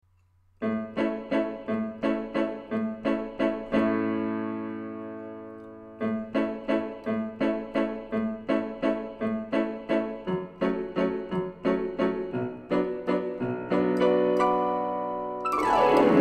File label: Piano Only; Toy Friends